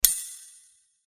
Sword Sound; FXHome; Fighting Sounds

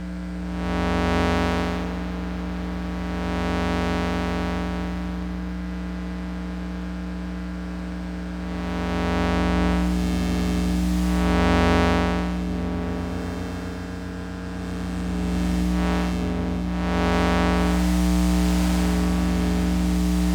Tonal Noise; SoundMorph; Lost Transmissions

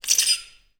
Glass Scrape; 99Sounds; The Warehouse

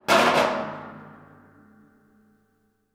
Corrugated Iron Sheet; 99Sounds; The Warehouse

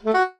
jingles SAX; Kenney's Sound Pack; Music Jingles

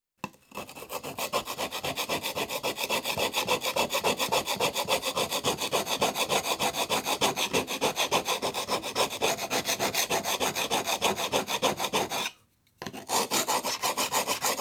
Chisel Sharpened On Grindstone; Construction; BBC Sound Effects Library

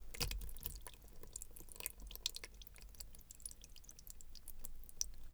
Drip Watermelon Sloppy, Gore; MKH8040; The Gut-Wrenching Gore Library

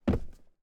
FOOTSTEP - Metal Board Land Barefoot Male; Timothy McHugh; Barefoot on Metal

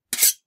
sword Metal; Kenney's Sound Pack

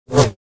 Saber; FXHome

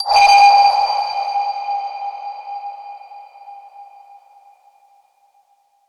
Ping, Trailer; Federico Soler Fernandez; Effective Trailer Pings

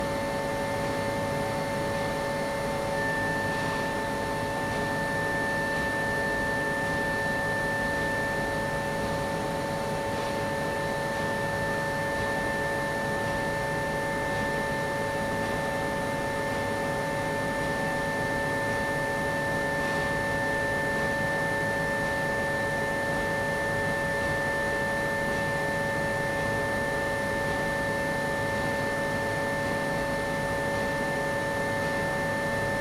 cryogenic laboratory ambience loop

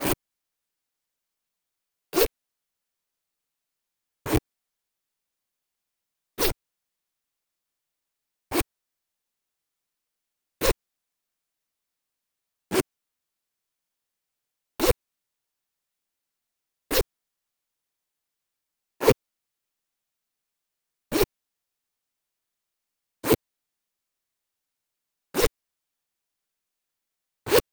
Jeans metal zipper - fast zips; Zippers; Mindful Audio; Elements

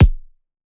Punching Perc, Straight Glove

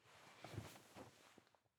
Body, Big Comfy Chair, Stand, Cloth; UberDuo; The Cabin Audio Playset

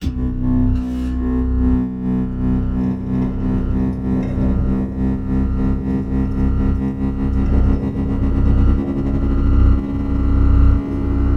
METAL - Spaceship Acceleration Deceleration; Design; G4F SFX

